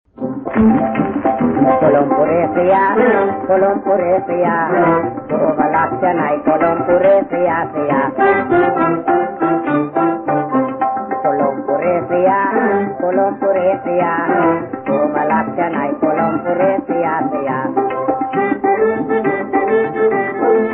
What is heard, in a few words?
Gramophone Songs